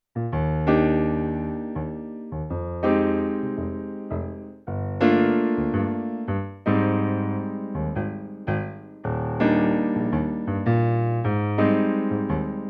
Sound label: Korg B2 Piano